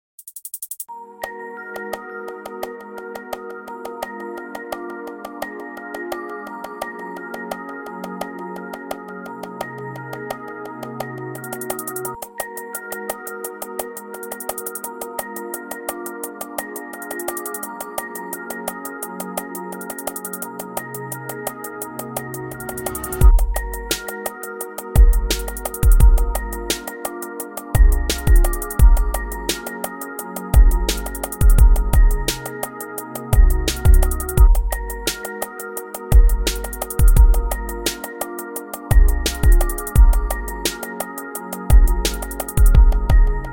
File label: instrumental